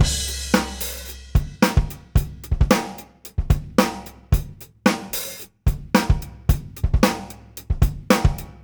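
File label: Beat Loops, 111 bpm; Zero-G - Monster Beats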